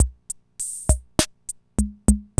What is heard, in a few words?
CR-78; Sound & Vision - Gigapack II